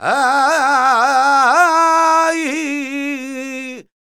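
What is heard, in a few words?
Flamenco, male cantaor, vocal; Best Service ProSamples vol.46; AKAI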